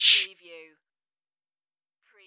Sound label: hover